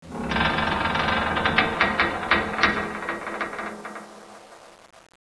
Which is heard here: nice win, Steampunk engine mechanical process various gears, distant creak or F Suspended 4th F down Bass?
distant creak